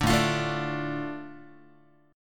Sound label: A Suspended 4th Sharp 5th, A, Guitar; Asus4#5 strummed; JGuitar